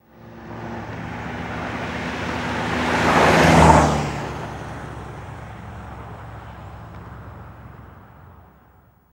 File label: Car Compact Drives By